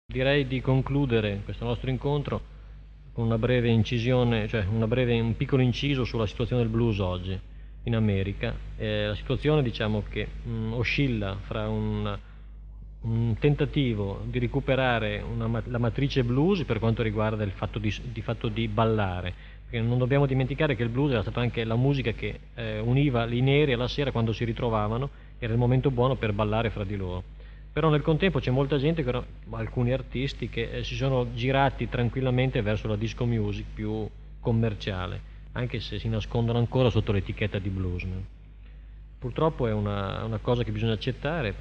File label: Blues; Blues Workshop